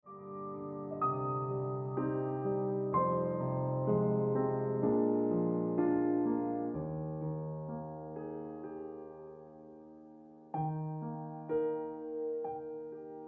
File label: Ringtone Piano Music